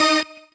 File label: normal hit normal